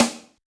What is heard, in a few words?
Open Rim Shot, Yamaha DJX 2B